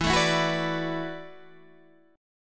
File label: E 9th Suspended 4th E down Guitar Open D